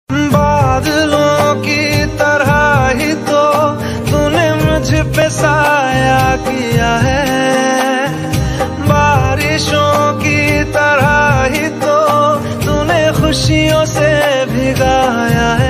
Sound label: Ringtone